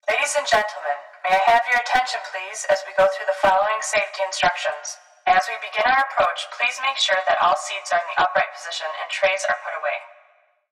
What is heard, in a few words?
vox